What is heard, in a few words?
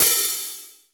hat, 60s kits; 90 sSampleCDs; Crypton - DrumBase5000